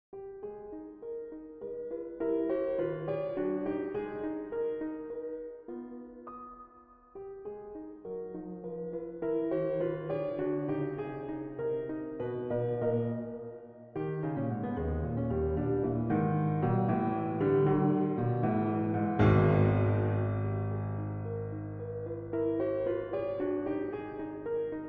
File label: Quarter-tone Piano; Quarter-tone Piano Prelude No. 2